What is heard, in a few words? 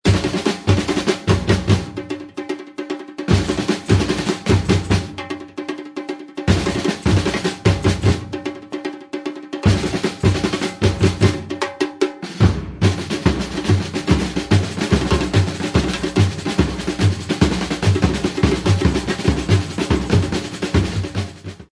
Break Snake, batucada; מעבר נחש